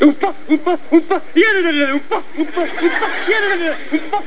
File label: oompah